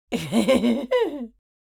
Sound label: Female old woman chuckle